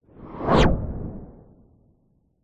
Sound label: science fiction craft race past zoom ultra fast; zapsplat